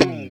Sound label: SLIDE DN, FUNK CHORDS, Guitars; Spectrasonics; Hans Zimmer Guitars VOL-1